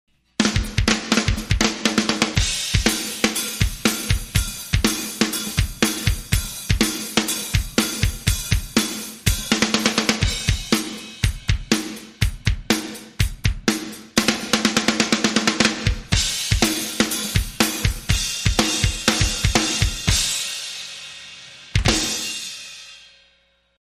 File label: Drums Only